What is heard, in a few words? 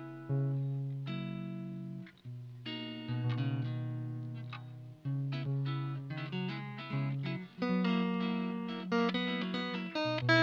jazz